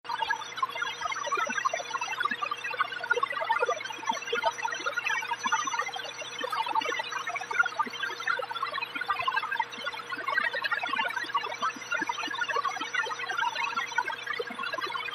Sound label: Alien Data Syntheti